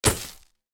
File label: Pickups item recharge; SFX; The Binding of Isaac